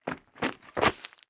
Foley Footstep Golf Cleats Shuffle On Leaves And Twigs; Foley Footsteps